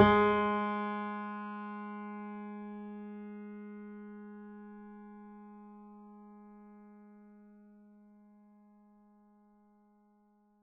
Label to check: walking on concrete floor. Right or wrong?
Wrong